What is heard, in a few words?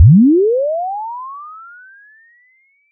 sweep